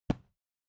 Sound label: Cell Phone Hardware Put Down Cushion